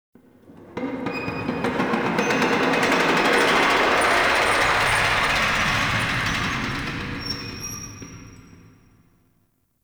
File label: Dungeon Island Chains, Ambience; Warframe; Duviri